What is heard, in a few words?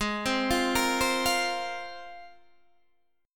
G sharp 6th Add 9th G sharp Guitar Standard; arpeggiated; JGuitar